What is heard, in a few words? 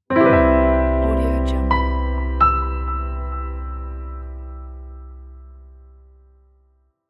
piano ident logo